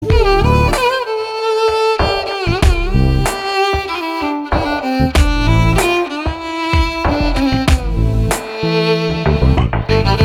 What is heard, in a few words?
Love Bgm